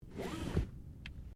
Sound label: Car Toyota Corolla seatbelt pull; 2009 Toyota Corolla hatchback; Cars (Sound Effects:Vehicles)